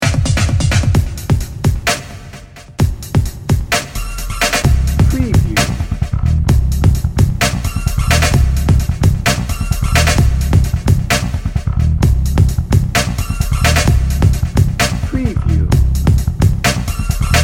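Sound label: funk in the booty drum and bass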